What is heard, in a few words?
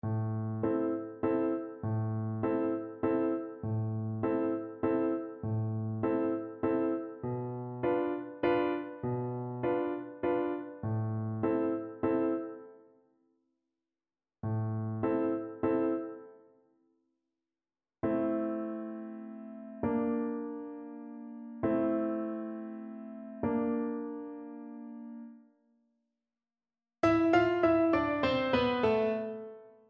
creak crunch scream, Recorder